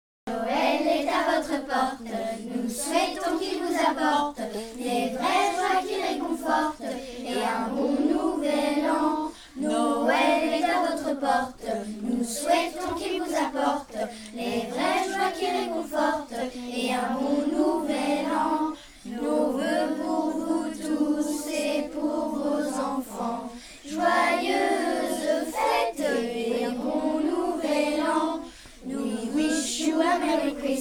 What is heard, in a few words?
Vocal